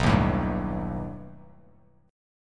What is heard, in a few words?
B Augmented 7th B down Bass Standard; strummed; JGuitar